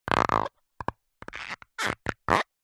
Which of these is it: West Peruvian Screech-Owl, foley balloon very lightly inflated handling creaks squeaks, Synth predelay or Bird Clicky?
foley balloon very lightly inflated handling creaks squeaks